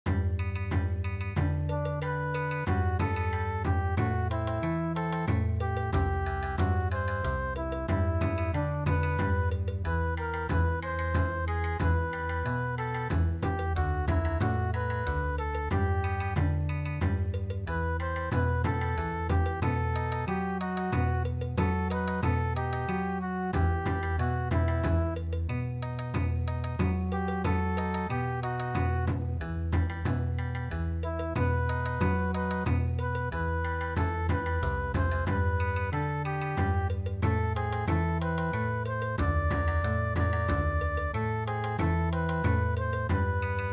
Bon Odori, midi

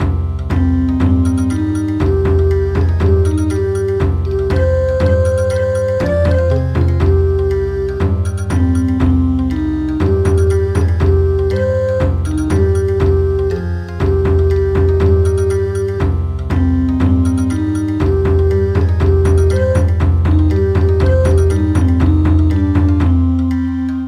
Bon Odori, traditional; SAND HILL

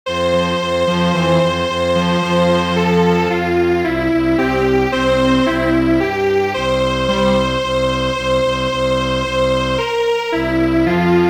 Strings Quartet, traditional

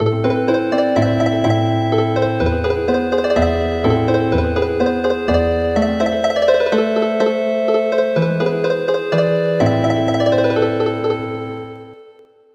Healin Harp; Why why you did not come

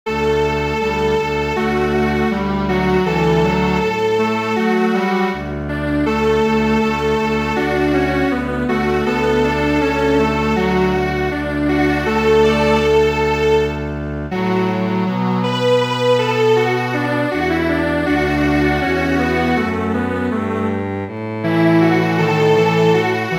Strings Quartet; A Sing Sing So

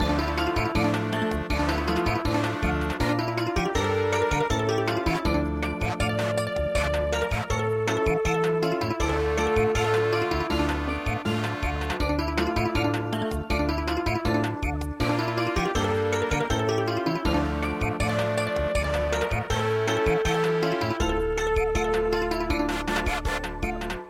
Samba, traditional

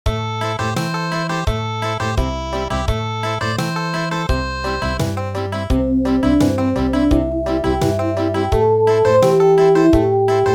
Country; Red hat white hat

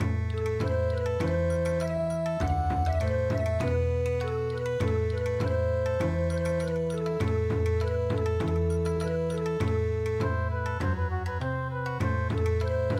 Bon Odori

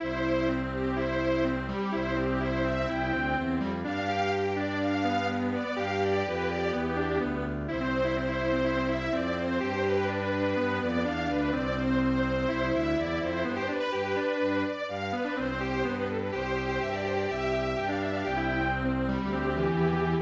Eine kleine Nachtmusik Strings Quartet

